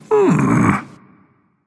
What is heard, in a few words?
thinking, voice; Smite; ymir skin14